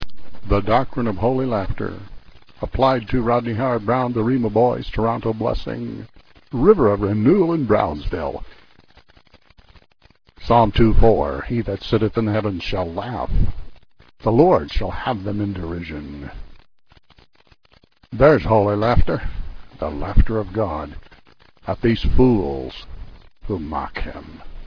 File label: holy laff